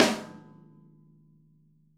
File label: SNARE, Interactive Drum Kits; Sonic Reality; ID SNARE 3+